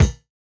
Kick, jungle breaks drum sounds

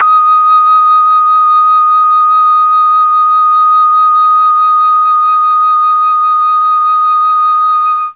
D#4 TRON FLUTE, Mellotron; Mike Pinder - Mellotron Archive CD

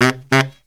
baryt, Killer Brass Riffs; USB Soundscan vol.29 - Killer Brass Riffs; AKAI

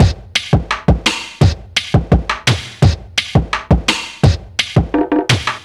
workbench, Drum Bass Drumloops 170bpm; Zero-G; Total Drum Bass

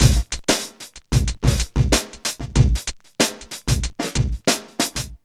SCRATCHY 94, Funky Elements LOOPS; Zero-G

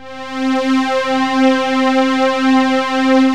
STRING, Techno Trance; E-MU Formula 4000 Series Vol. 2

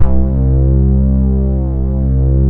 PADS; Pro-Rec - Synthsonic; Z1 PADS

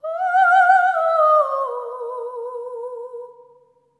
SERENE, Symphony of Voices; Spectrasonics

